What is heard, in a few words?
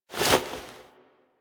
Foley Misc; Warframe; Operator